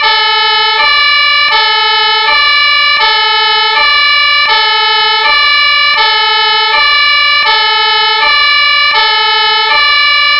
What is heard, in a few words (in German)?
Martinshorn lang